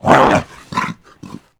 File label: attack; tf; slender; gabel